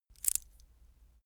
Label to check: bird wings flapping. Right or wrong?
Wrong